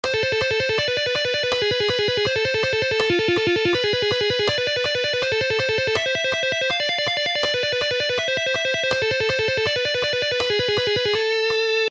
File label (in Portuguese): Exercício tapping